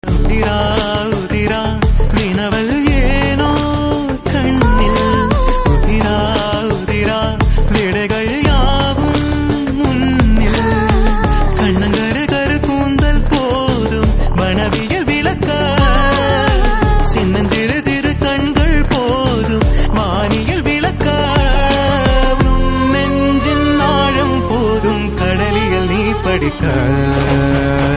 Male Voice Ringtone; Tamil; Pon Manickavel

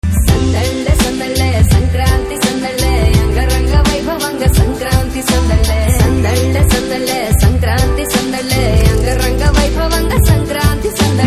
Promo Ringtone, Telugu; Sreekaram

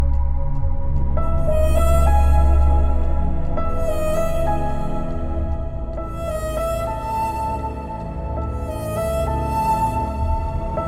Leaves His Child BGM; Adam Joan; Malayalam

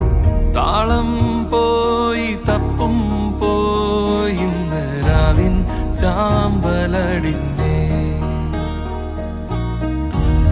Male Ringtone, Malayalam; Ayyappanum Koshiyum

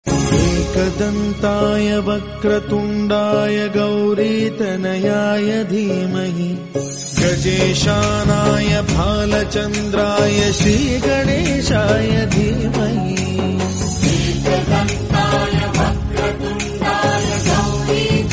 Ekadantaya Vakratundaya Devotional Hindi

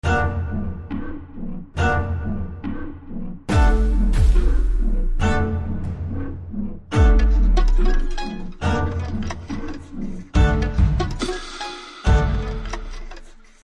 Trailer BGM Ringtone; Telugu

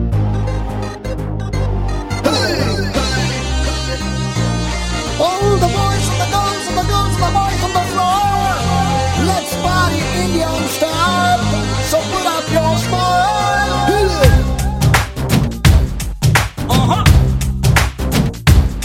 Music Ringtone; Mirchi; Telugu

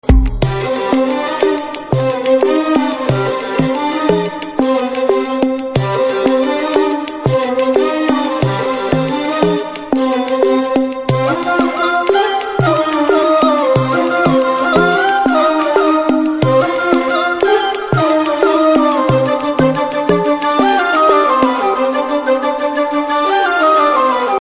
Tamil Love BGM Ringtone; NGK

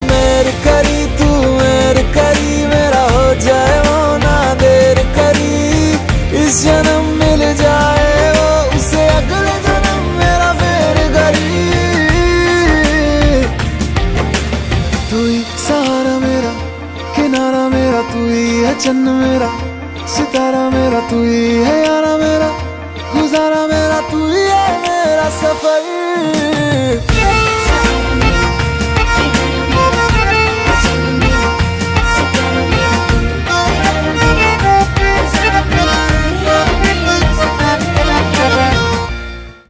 Instrumental and Lyrical Ringtone

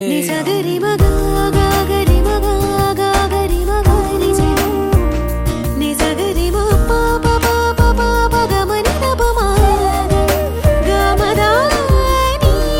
Female Ringtone; Telugu

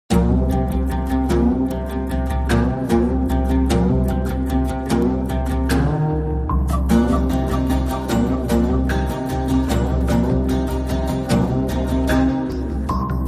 Guitar Ringtone; RRR; Hindi

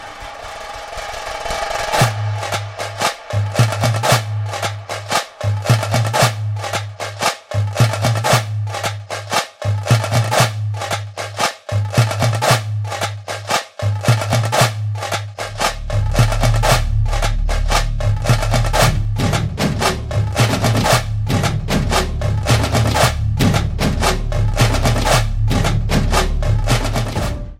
Drum Beats Bgm Ringtone; Richard Anthony; Kannada

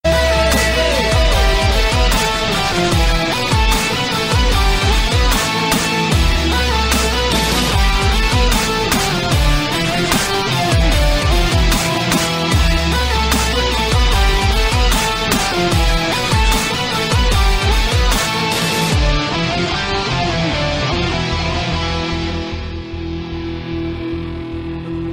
Intro Bgm; Sahir; Dhoom 3; Telugu

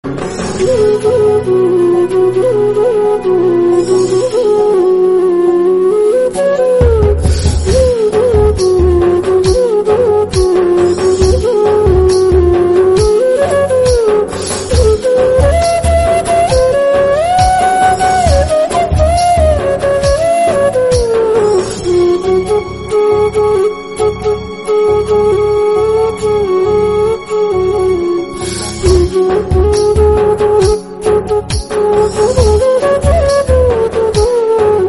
Instrumental Flute Bgm; Telugu

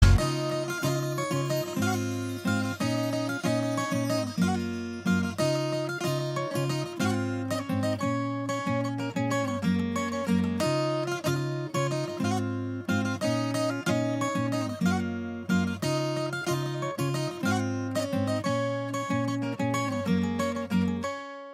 Instrumental; Punjabi

